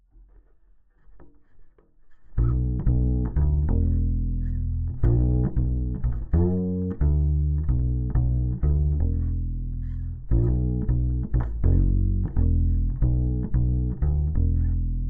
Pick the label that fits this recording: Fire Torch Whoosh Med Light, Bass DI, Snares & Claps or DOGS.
Bass DI